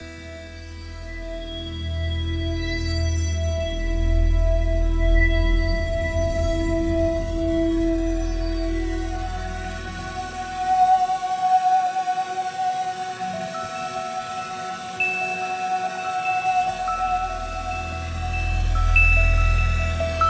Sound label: Baby Steps Loop